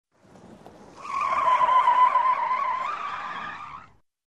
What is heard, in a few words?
Car drifts around corner